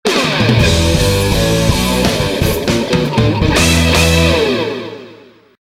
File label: Victory; F-Zero